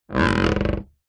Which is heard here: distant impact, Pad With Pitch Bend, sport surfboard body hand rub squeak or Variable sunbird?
sport surfboard body hand rub squeak